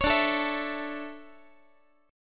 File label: D Major 7th Suspended 2nd, Guitar; strummed; JGuitar